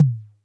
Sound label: Toms, Elektron MachineDrum; SPS1 MKII